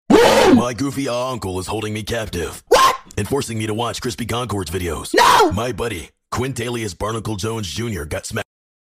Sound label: goofy sound